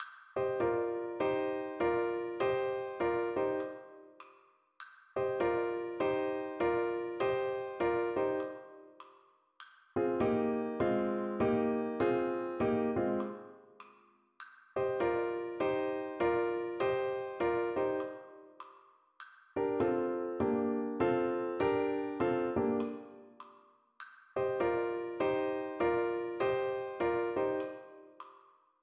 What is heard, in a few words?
Blues